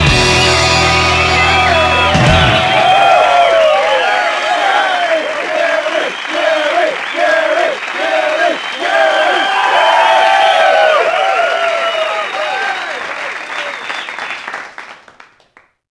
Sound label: Tourny Success Stinger; bully scholarship edition